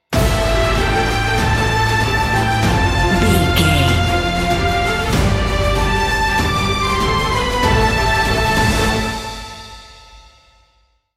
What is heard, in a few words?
epic cinematic sting bumper